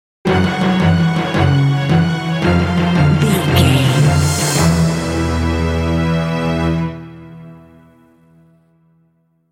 swirling mystery stinger

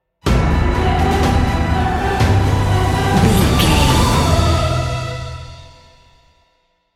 epic cinematic sting bumper

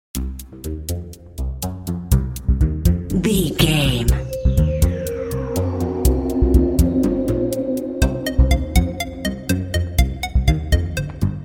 mysterious mission video game

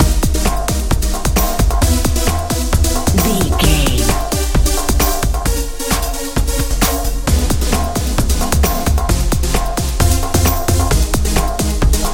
colorful nice echo head life pop dance

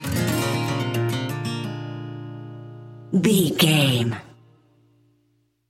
too busy blues sting

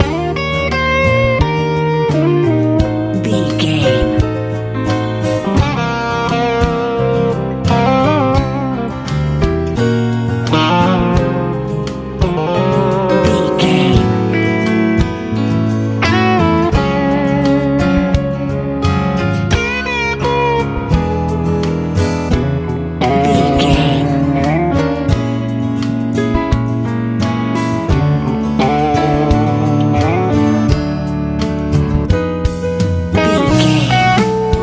strike big warm happy love easy pop

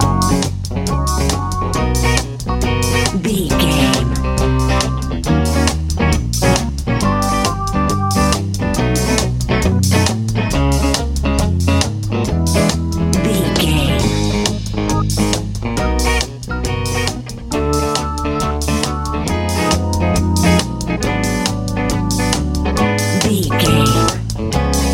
bouncing blues

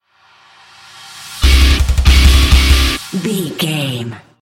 rock power stinger

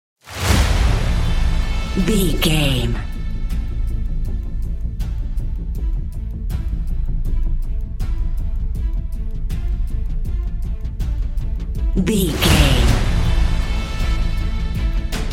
epic hopeful suspense

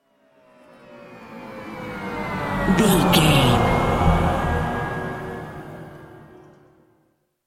breaking point falling swell

